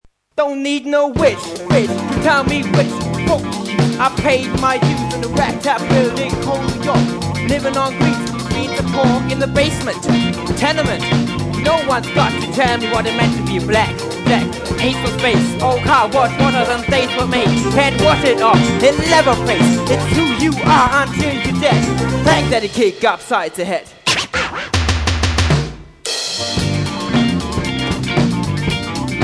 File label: rap